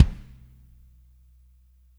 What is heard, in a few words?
WRM KIK, WARM+FAT KIT; Spectrasonics - Burning Grooves CD2